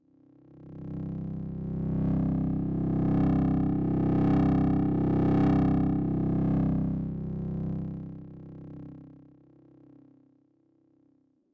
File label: activation; Incantation